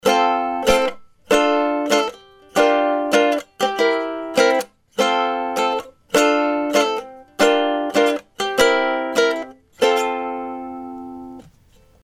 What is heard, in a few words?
ukulele; IMG Stageline ECMS 90